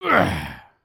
stun

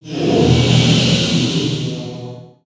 breath moan; ninjago

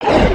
run, creatures organic

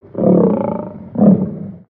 Pet Trike Idle; creatures pets

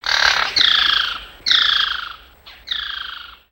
Bird Amazon Oneshots; ambience; gnarled forest

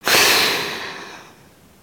snore out

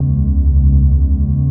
machinery loop

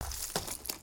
Pet Elephant Digs; creatures pets

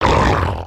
Tentacle Monster Growl; destructibles

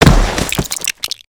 wall smashable; avant gardens

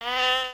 Sheep Baah; creatures organic

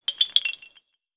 Bamboo windchimes; ninjago

